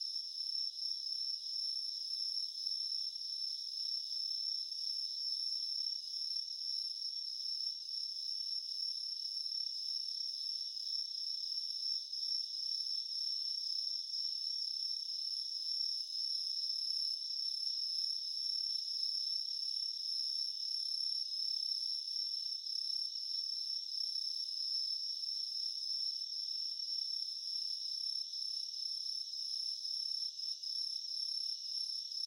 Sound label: Singing Of Insects; LOOPERS